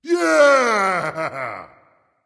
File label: nv yay; smite; voicepack; ymir